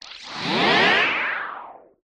ascend brief right with click flip left then chord ripple ominous negative; hiss; skyclad sound